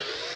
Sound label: inserter inventory move; factorio 2.0.55